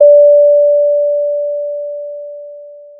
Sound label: Sound of a doorbell